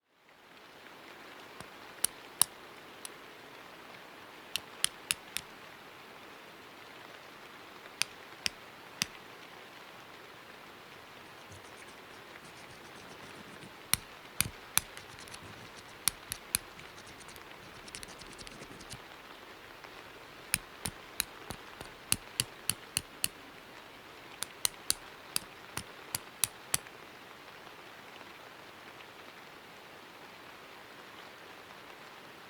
Maud trying to start a fire with flints of various sizes in a cave during a storm; Pony Music Archive